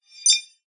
multimedia notification short digital futuristic beep generic